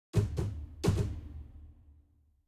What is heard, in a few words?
Music Battle